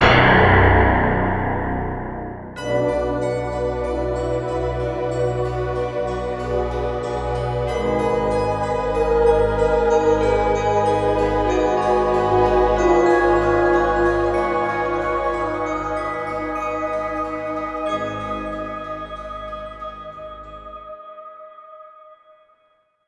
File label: Mission Failed (Alternate); Jak II; PS2